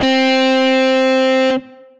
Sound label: Guitar - Dual Amped Heavy; Guitar and Bass; Ableton; Factory Packs